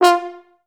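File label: FHS1 staccato F3, Advanced Orchestra; Best Service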